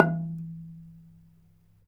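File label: ranat thum E3; Spectrasonics - Heart of Asia CD2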